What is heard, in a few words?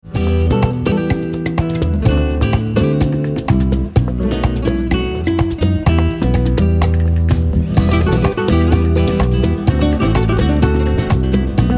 flamenco